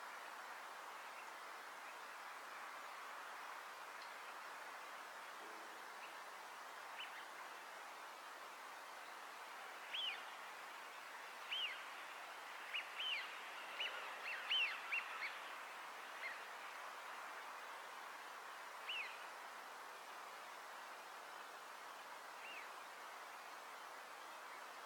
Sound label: Eurasian wigeon; پرنده گیلار اوراسیایی